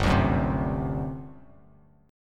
G Major 7th Suspended 4th G down; strummed

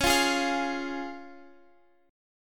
C sharp Major, Guitar Open F; x,x,13,12,11,12; strummed; JGuitar

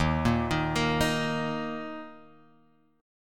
D sharp 5th, Guitar, Open F; arpeggiated; D#5; 3,5,3,5,6,x; JGuitar

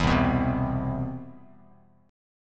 C Major 7th, Bass Standard; CM7 strummed

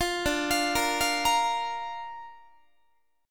D Minor Sharp 5th D down Guitar; Dm#5 arpeggiated; JGuitar

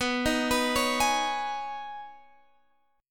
B 6th Add 9th B Guitar Half Step Down, chord

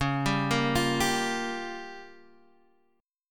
C sharp 6th Flat 5th, Guitar, Drop D; down; chord shape

